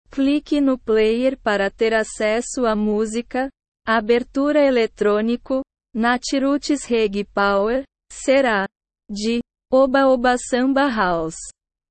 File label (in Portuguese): abertura eletronico reggae power